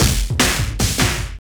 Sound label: Hardcore, Drums Beats